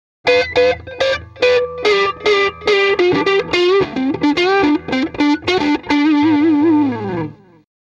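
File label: m75 lp neck pickup light overdrive jtm45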